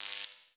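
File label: Discharge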